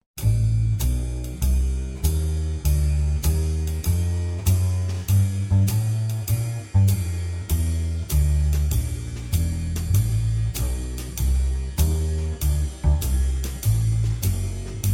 Min ii V Bs Dr slow

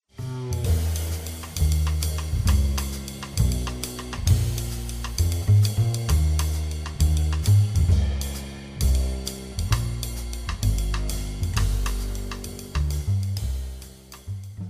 Bebop BsDr

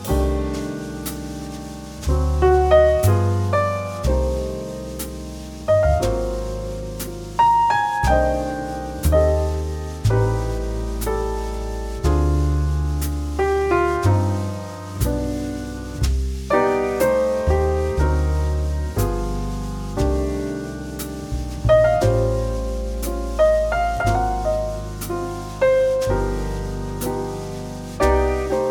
Trio

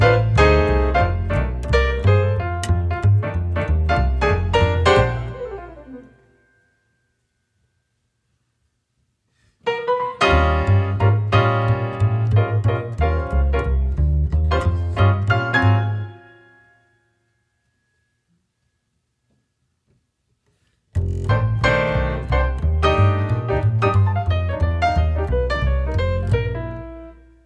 Pno Bs

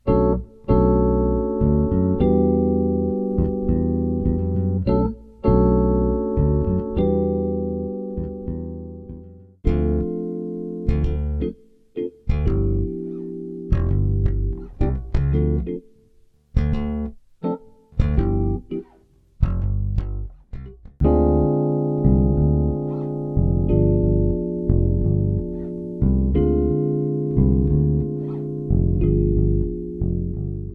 Contempo Trax Pno Bs

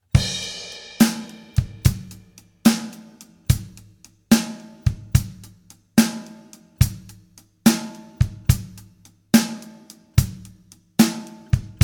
sample Slow Shuffle

